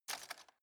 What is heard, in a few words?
cutlery plastic drawer grab soft